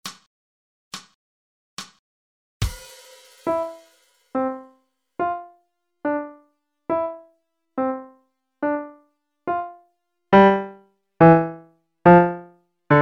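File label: Jumping Sparrow, Pianoforte; Chim se nhay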